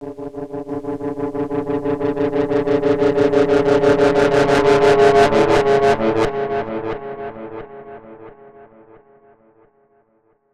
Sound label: FX DIST; FX DISTO